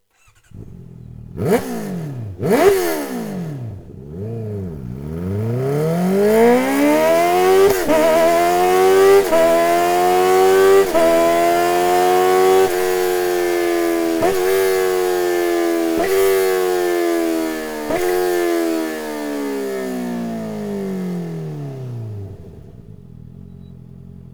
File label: akrapovic racing exhaust system honda cbr650 f cb650 f